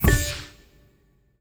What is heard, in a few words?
Ui Reward; Forza Motorsport 6 Apex